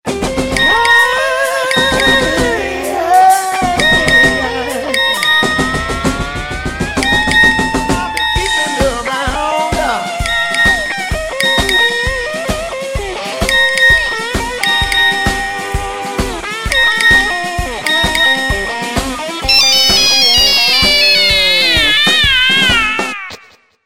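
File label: standard tone low battery ringtone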